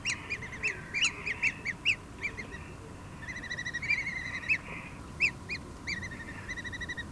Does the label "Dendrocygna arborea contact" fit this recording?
Yes